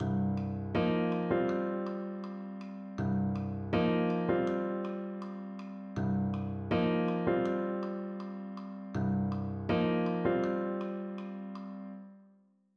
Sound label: Pentatonics, piano